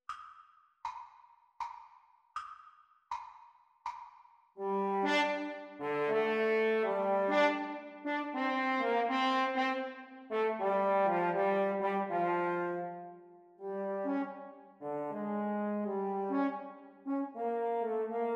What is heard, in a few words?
French Horn Duets Playalong Practice